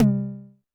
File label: TD-20 Tom A, Roland TD-20 Toms